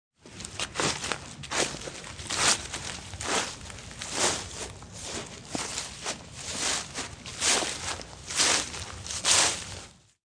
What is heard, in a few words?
Rak Leavs, Raking leaves in yard.; Raking Leaves 2; Yard Tools